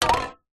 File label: furniture polish can knock over wood surface; household